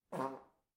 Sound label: fart toot